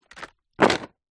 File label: leisure puzzle pieces pick up and put into card box